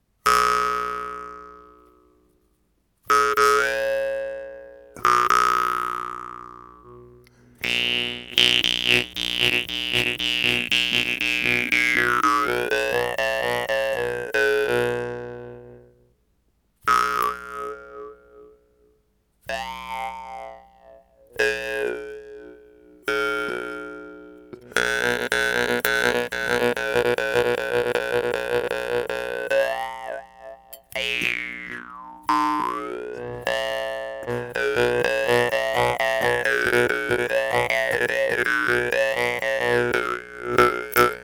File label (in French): DROMBA, guimbardes